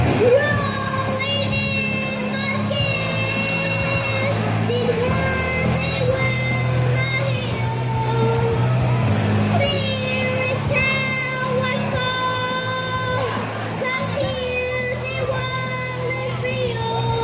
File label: crawling kid